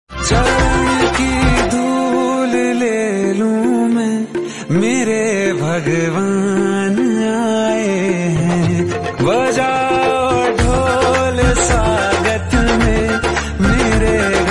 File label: Bhakti Ringtone